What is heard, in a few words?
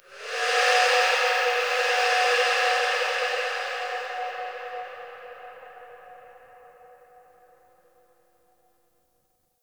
Texture Interior Medium, Ambience; Sentient Lair; Warframe